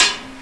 thung

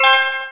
coins; Universo Animado

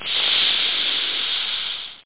snake hiss; AmigaMUD

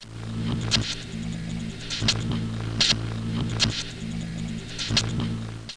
STARTUP; Gamezone

